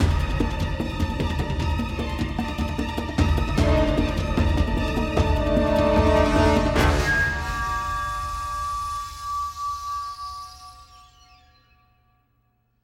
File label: Chase Stinger; Cartagena